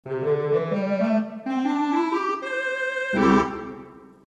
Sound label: 8 Tibia 8 String 8 Oboe 2 2/3 Tibia, theatre organ